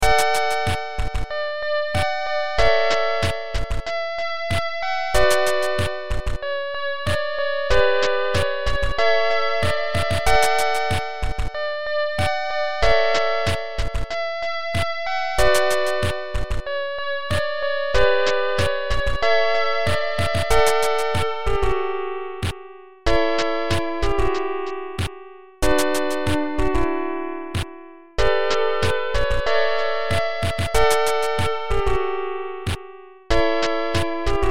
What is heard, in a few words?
falling raindrops